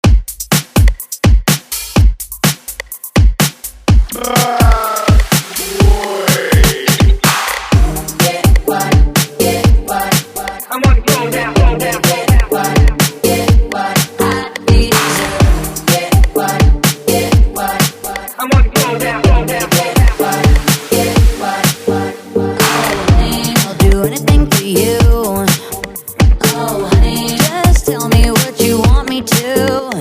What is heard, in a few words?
Break Beat Mix Hype